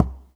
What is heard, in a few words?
Knock